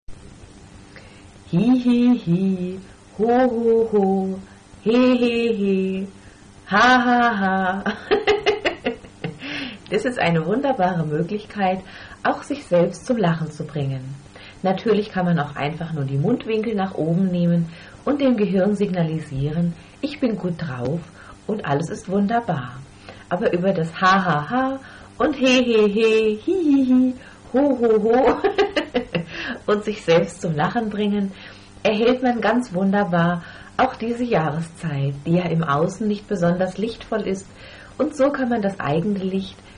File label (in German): Lach Hehehe